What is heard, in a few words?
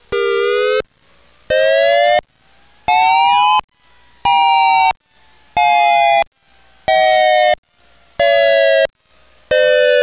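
Pulsed Wail, outdoor warning siren; DSA: Directional Speaker Array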